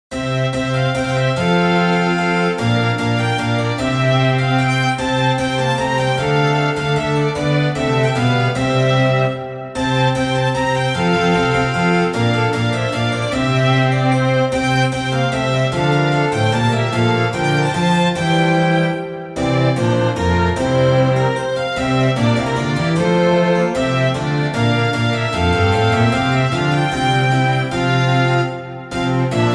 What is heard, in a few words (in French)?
musique de chambre